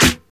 Original Clap Single Shot E Key, clap sound clip tuned to the E note; single hits one shots